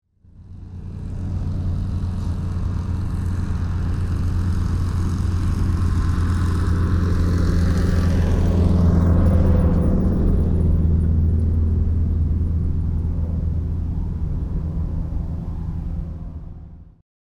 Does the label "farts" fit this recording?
No